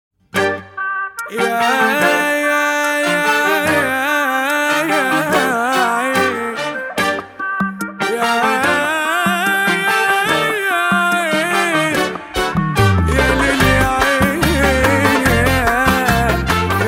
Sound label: Oriental; Youth